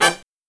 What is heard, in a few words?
HIGH HIT